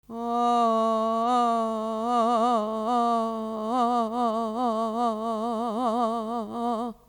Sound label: vibrato wrong